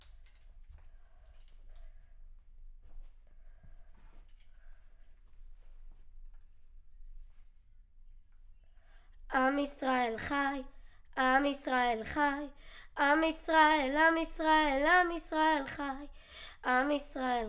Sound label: Children Choir; Studio Bekol Children Choir